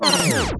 evil druid die

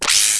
wind ghost attack

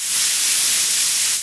pyramid sandfall

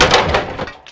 die; aliot

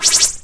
rampage blaster

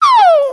siroma damage

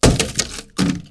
stone shooter die

